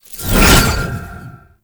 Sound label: Rune Cast Burst; Warframe; Uriel; Vythelas